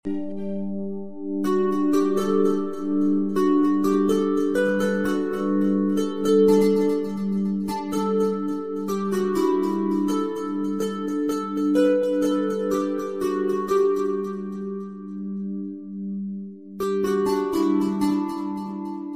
chimes ringtone

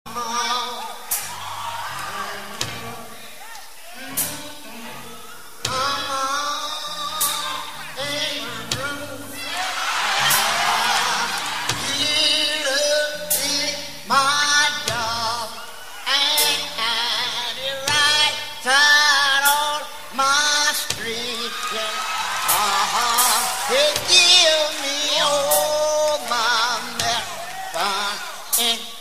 mass choir

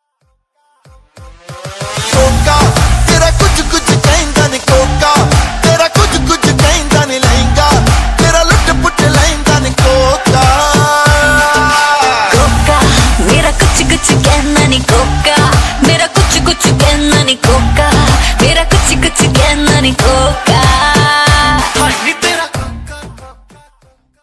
romantic punjabi remix ringtone

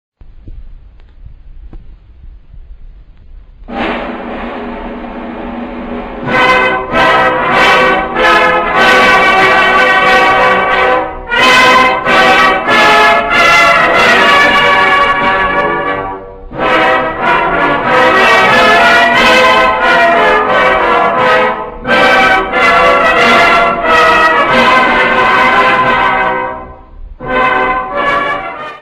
pakistani national ringtone

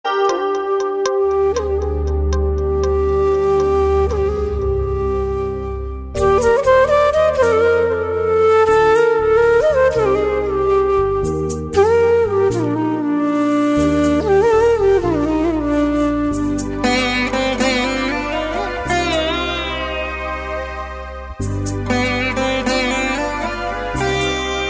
romantic flute ringtone

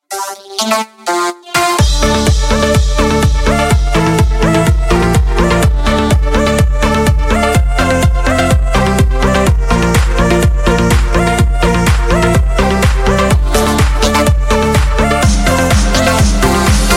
house disco love ringtone

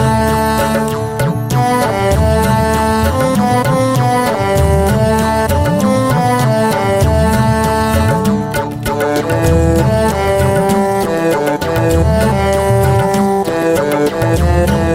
hindi ringtone, bollywood ringtones

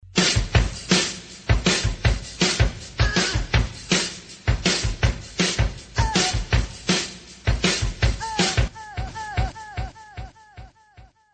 rocking music call notification tone; galaxy ringtones

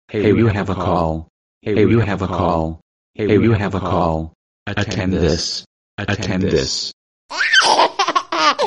you have a call musical tone ringtone

